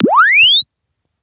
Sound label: Press Two Sides Chirp; Samsung 4 Recordings